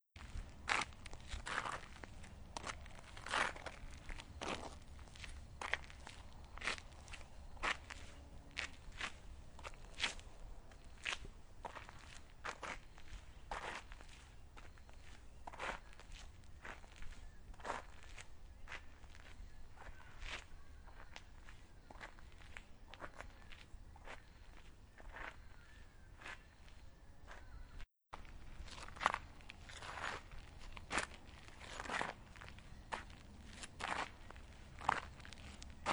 Footfalls Soft Shoes on Crunchy Dirt; footsteps

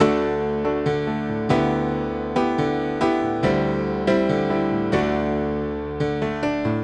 Classic Rock Piano

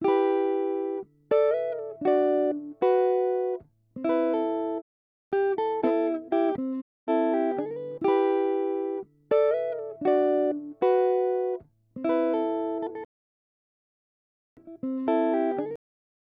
Elec Gtr

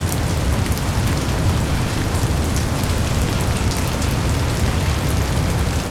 RAIN

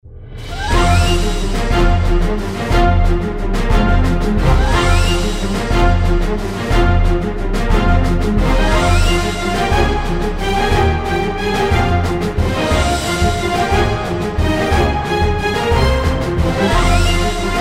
Doom, Music background pads

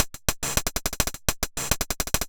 808 Loop Hats 105, Roland 808 Drum Loops, 105bpm

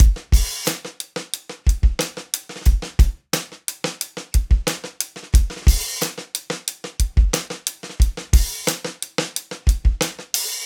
90 Acoustic Drums, Live Trip Hop Drums; Loops; Smoke Signal

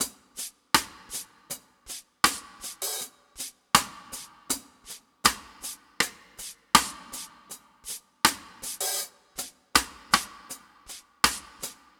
Top Drums; Lust; Guitar Hypnosis

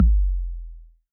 808 BD long, Roland-808 Drum Hits Bass Drum

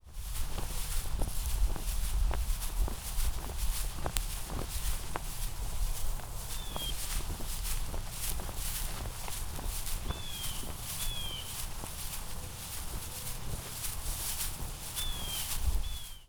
Footfalls In Sand; footsteps

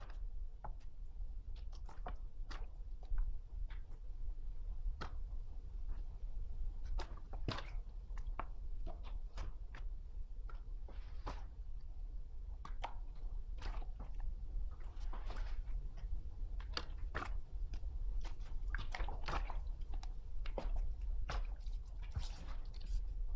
A Soft gel collapsing; 384 Sound Designs

